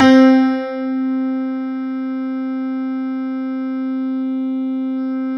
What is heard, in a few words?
C5, Synth Line